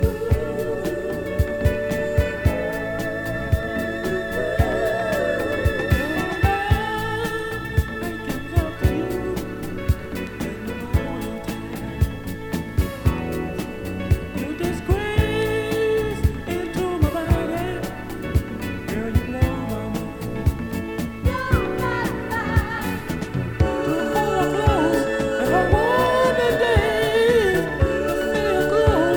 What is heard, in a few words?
united voice